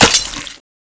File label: dragonsword hit; cstrike; bb weapons